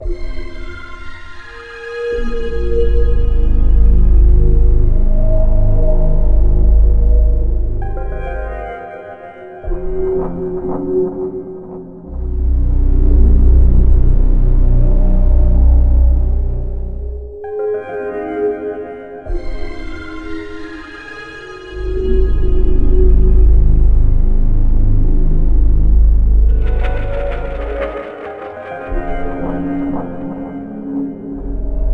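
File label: music int canals ambience loop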